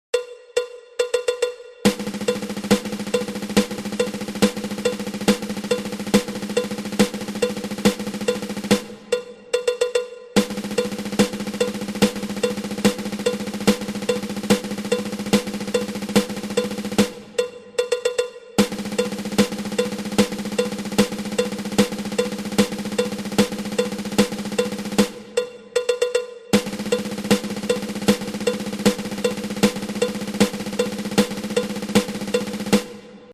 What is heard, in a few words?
Eleven Stroke Roll